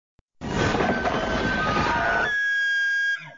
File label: Wendigo sound